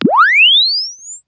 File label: Press Two Sides Chirp; Samsung 4 Recordings